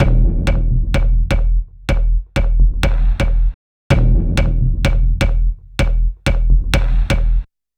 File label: Modularloop enhancer